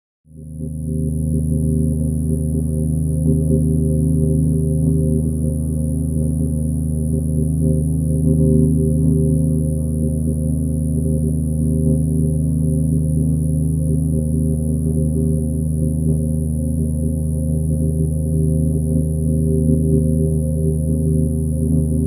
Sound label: stuttery synth octave down